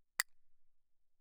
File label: castanet, ff; Percussion; hand percussion; castanets